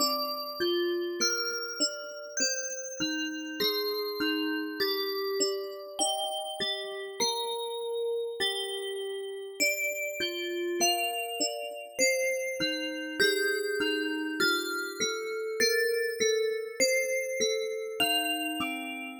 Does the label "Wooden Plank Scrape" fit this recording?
No